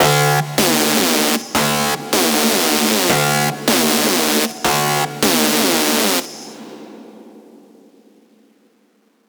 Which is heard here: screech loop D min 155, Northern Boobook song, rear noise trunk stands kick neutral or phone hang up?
screech loop D min 155